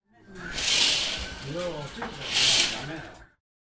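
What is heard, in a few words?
kaaten wo shimeru, closing the curtain; カーテンをしめる音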